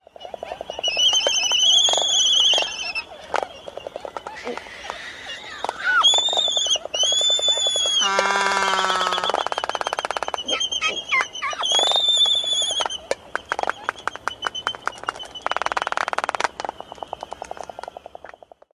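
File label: calls and bill snapping; MIDWAY ISL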